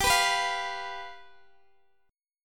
G 7th G down Guitar Open D

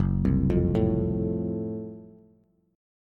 F sharp Minor Major 7th Flat 5th, Bass; chordshape; down

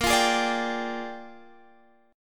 Bb 13th, Guitar, Standard; Bb13 strummed

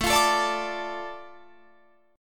A Suspended 4th Sharp 5th, Guitar Drop D

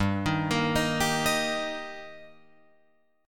G Minor Sharp 5th G Guitar Open F, chord; arpeggiated; JGuitar

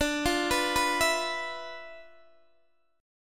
D-Minor 6th Add 9th, D, Guitar, Open D

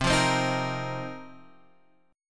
F 7th Suspended 4th C

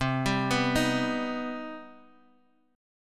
C Minor Major 7th C Guitar DADGAD

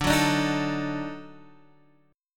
D sharp Minor Major 7th Flat 5th D

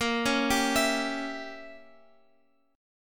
A sharp Diminished 7th A sharp, Guitar Open F; x,x,10,8,10,11; arpeggiated

